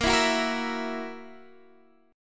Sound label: E Suspended 2nd Flat 5th Bb